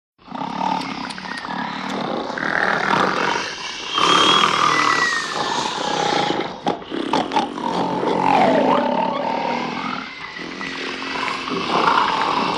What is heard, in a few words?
Group Exagg Snores